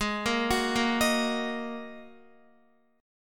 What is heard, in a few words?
Ab 7th Suspended 2nd Ab, Guitar; arpeggiated; JGuitar